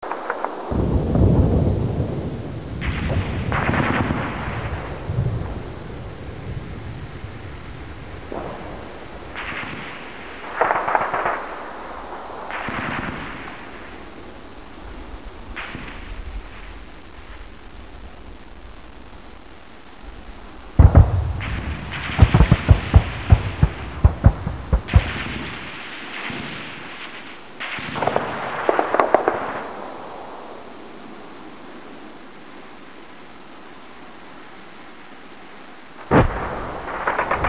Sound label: battle ambience; dod